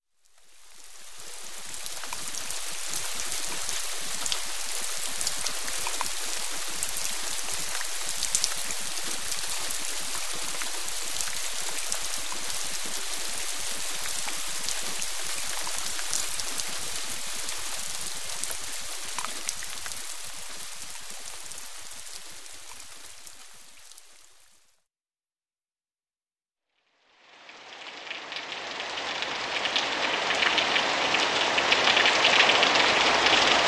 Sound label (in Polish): Odgłosy padającego deszczu